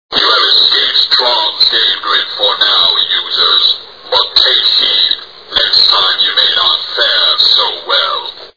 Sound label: people